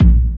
DR. LOOP, DRUMS; X-Static Goldmine 3

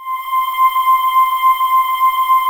SPACE VOX, JV PADS; Pro-Rec - Synthsonic

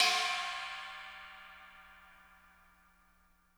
CHINA CYMBAL

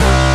DANCE SYNT; E-MU Formula 4000 Series Vol. 2

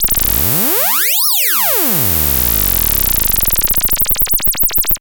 NL FX MIX; Masterbits; Vintage Voltage; SQ+FX

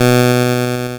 NOTE B3, LSDJ; LIMAT LSDJ kit; LSDJ Notes; NOTE KIT 1; Drums

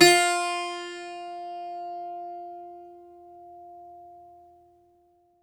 Greek Bazouki, Plucked Strings

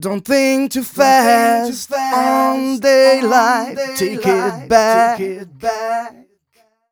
130 mel g, Vocals

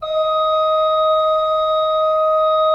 D4, Cathedral Organ, ROHRFLOETE; Propeller Island

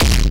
SUPER DISTO, Techno Trance, Perc; E-MU Formula 4000 Series Vol. 2; OrbitPercMaster

